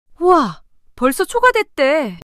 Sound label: surprise